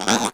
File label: jump